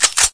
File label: sg552 bolt pull, weapons; Source Engine; cstrike